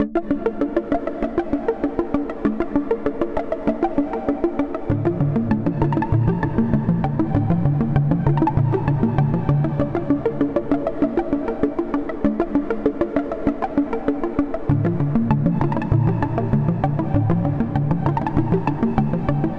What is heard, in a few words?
Chord Background Reverbed Strong, D maj; instruments; loops; synth